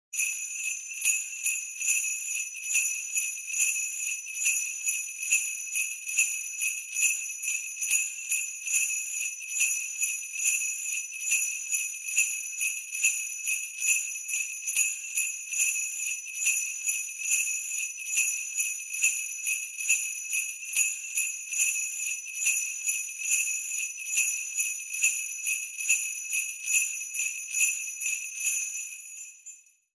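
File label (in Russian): ритм гимна рождественских колокольчиков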